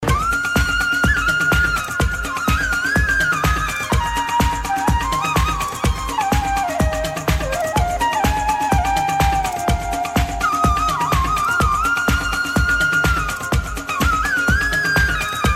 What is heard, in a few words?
Flute Medium; Hindi